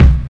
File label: good kick one shot b key; tuned drums